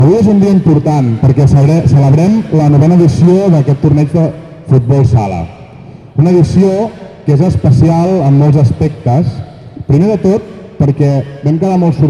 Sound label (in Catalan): Trilles